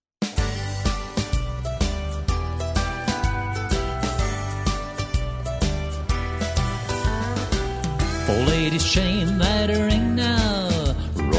vocal; square dance music

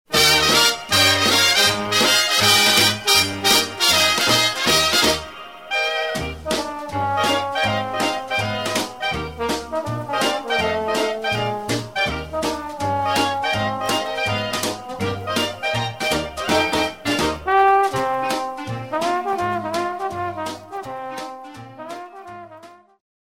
Two Step Music

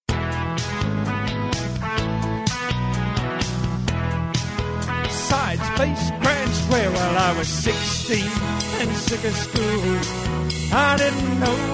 vocal grand square; square dance music

